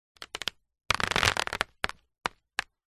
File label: nature water drips sudden short paper sheet